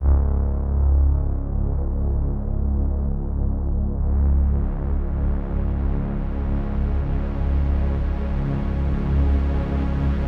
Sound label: Overlay Drone